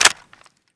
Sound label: g3sg1 clip in, svu